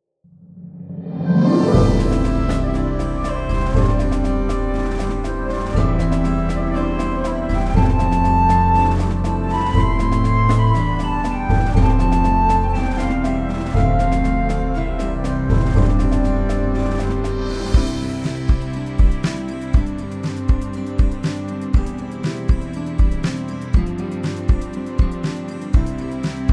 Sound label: Ode To Joy 120bpm